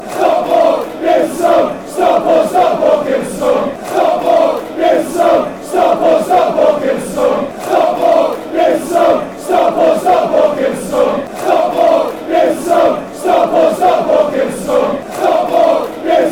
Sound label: give us song; stockport